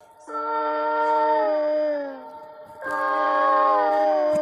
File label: creepy meow